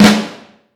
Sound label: AFL, Vintage Snare Drum; Q Up Arts; Johnny.C's Vintage Snare Drum